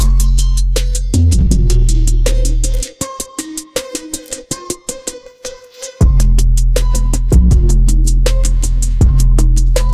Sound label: aggressive drift phonk beat